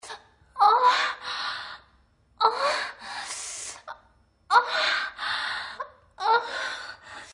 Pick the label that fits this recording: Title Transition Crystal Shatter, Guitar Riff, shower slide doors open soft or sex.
sex